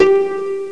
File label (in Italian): Lira; MYTH